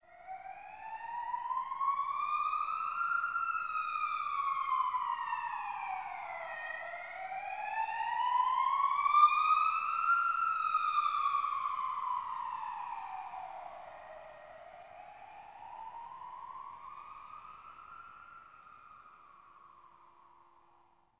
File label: Exterior Distant Ambient Siren A; Warframe; Ambience; NineteenNinetyNine